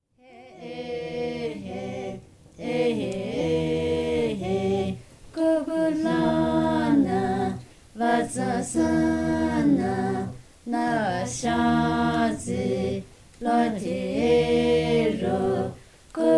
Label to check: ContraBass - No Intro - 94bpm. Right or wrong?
Wrong